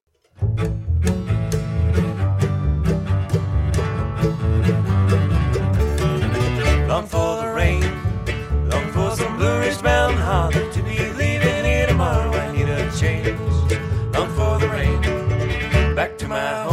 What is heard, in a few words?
String Band